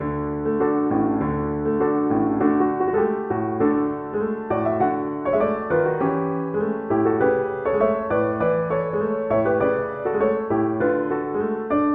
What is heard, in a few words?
tango, piano; albeniz